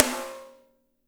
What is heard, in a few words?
PIC SNR; SNARES; Spectrasonics; Burning Grooves; BG SNARES 4